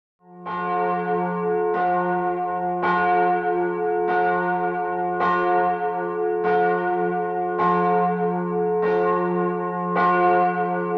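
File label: Church Prague, bell towers; St Havels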